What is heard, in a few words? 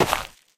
cleats dirt, player footsteps